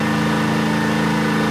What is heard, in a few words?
Engine Jeep Rpm Med; boom karts multiplayer kart racing android